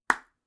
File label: pop sound